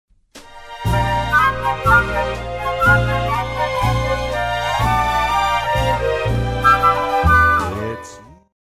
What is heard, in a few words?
Soft Shoe